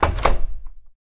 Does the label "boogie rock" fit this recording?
No